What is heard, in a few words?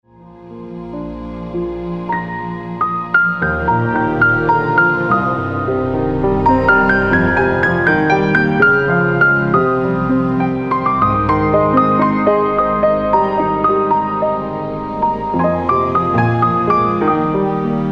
Lullaby, INSTRUMENTAL